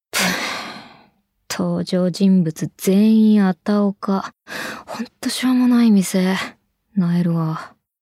fail sound

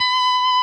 Left hand vibrato

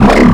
normal hit whistle

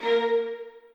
strings

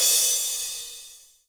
soft hit finish

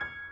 piano high

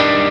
x x 6 4 5 Dmaj7

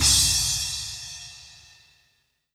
cymbal dramatic

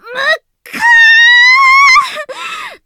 combo break

